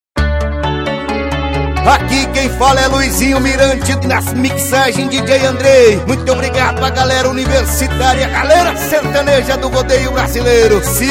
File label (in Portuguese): sertanejo com locutores de rodeio